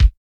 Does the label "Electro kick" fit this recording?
Yes